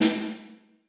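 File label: snare right hand mf, percussion; Sonatina Symphonic Orchestra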